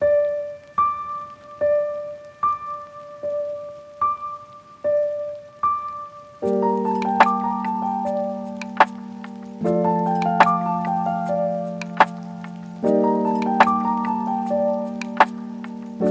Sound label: RAIN Lofi